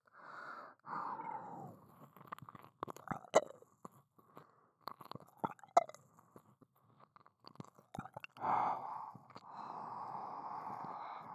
左耳舐め, ASMR; アドリブ集

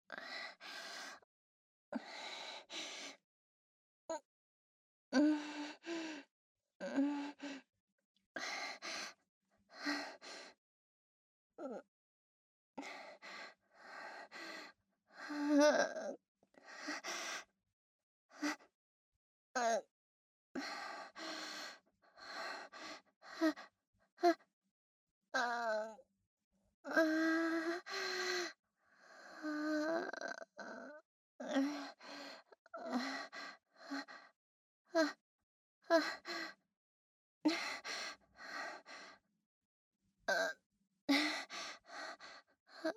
素股喘ぎ, ASMR

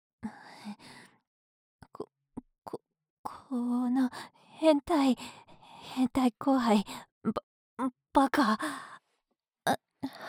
右 唾ブラシ, 耳かき 環境音なし

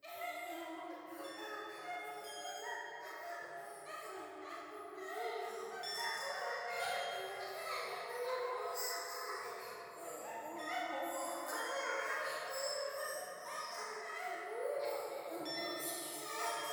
教会 喘ぎ 寺

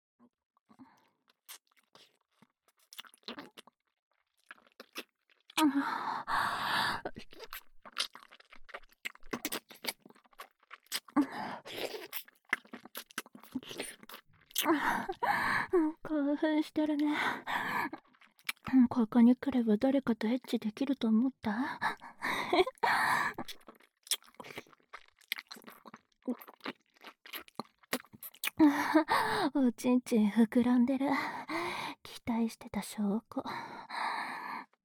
ねっとりフェラ, ASMR

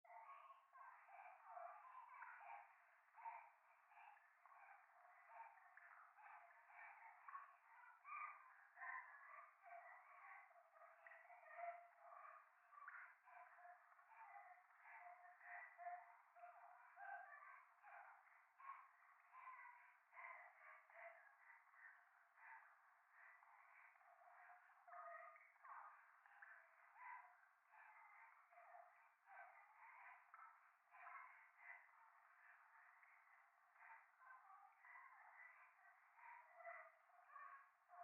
夢の中 可愛い喘ぎ 雨上がり

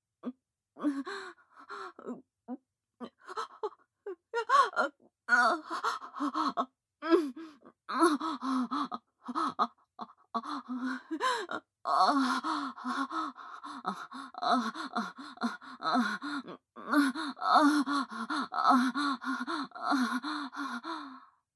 オナニー・激しい, ASMR; loop音声

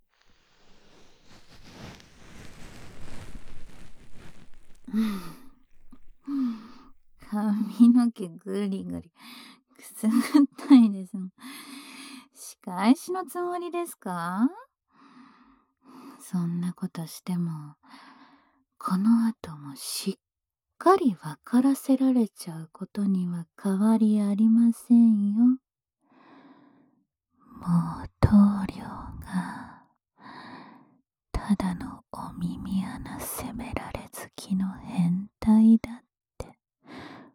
左耳かきパート, ASMR

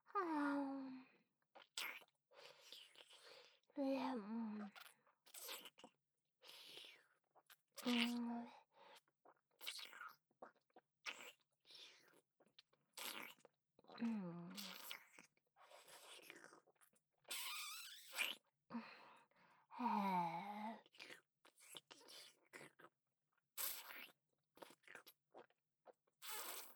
フェラ, ASMR; アドリブ集

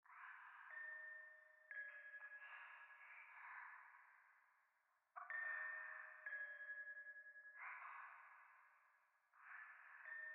廃墟 左耳密着処女喘ぎ 神社

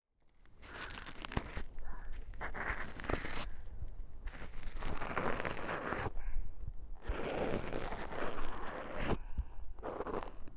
右耳 吐息 耳かき ループ; 月の吐息&寝息; 距離感の近い甘えん坊な仲居『月さん』; 和み処 一番星

